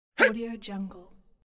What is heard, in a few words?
fight shout